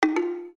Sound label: ringon sms